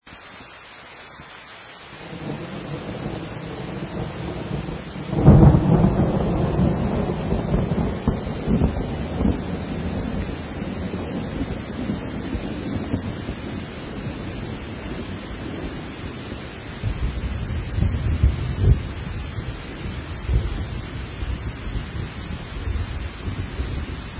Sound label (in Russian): Звуки живой природы Звук дождя и грома